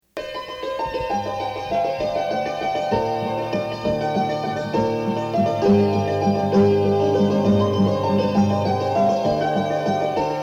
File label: Saturday Night Waltz; greatlakes